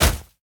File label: Racket Snowball Hit